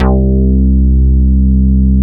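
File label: POCKET BASS C3; POCKET BS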